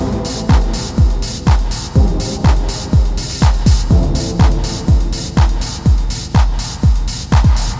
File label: fashion lofi